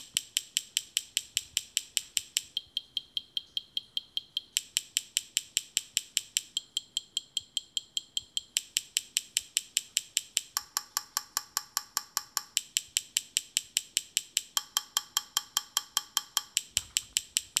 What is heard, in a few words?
piezos clicks cup